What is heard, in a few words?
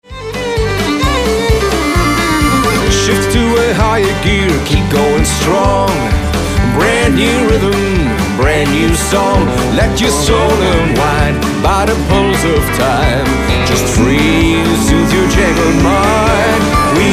change rhythm